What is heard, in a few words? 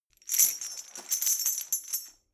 metal chain handle clean